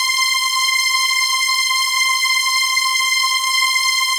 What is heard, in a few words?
PAD, Dance Mega Synth